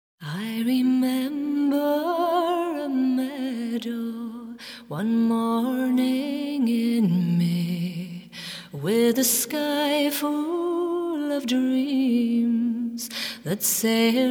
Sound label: Slow Waltz, Tanzmusik